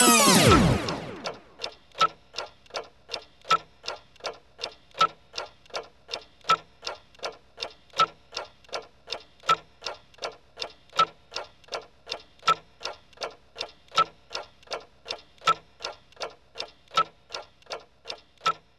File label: Jingle stop Slow; Blinx 2 Masters of Time and Space; Xbox